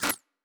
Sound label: Glitch, Electric